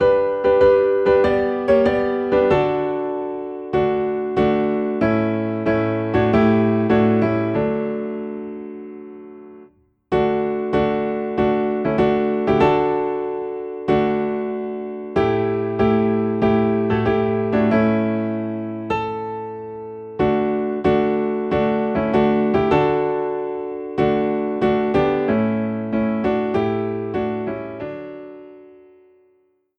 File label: Piano in D